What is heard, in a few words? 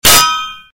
anvil; soundboard